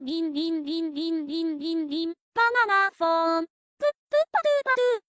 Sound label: notifs sound; current notifs sound